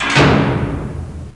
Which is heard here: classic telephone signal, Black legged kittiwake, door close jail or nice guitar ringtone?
door close jail